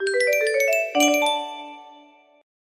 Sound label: music box melody; toggolino